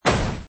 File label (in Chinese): 破坏声音